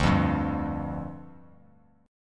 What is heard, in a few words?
D Minor Double Flat 5th, down, Bass Standard; Dmbb5; strummed; JGuitar